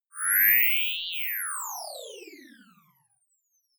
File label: whoosh sci fi sweep